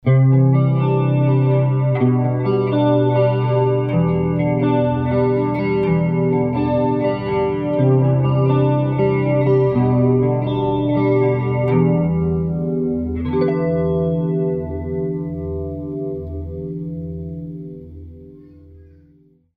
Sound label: Chorus